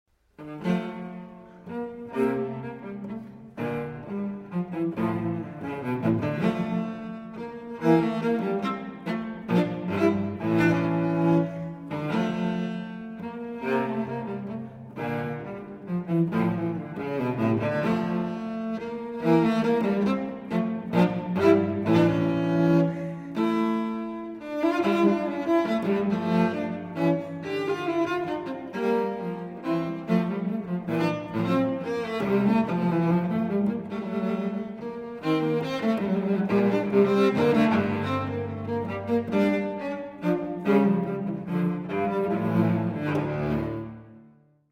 Unaccompanied Cello Suite No. 2 in D Minor Menuets I & II; BWV 1008